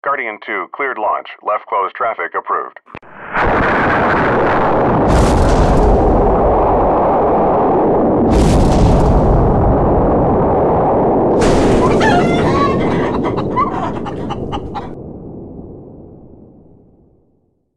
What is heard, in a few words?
Rocket Chicken